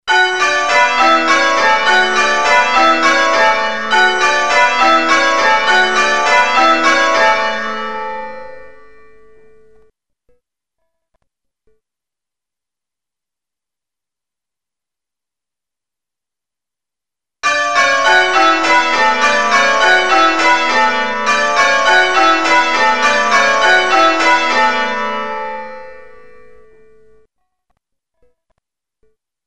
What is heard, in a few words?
Queens, changeringing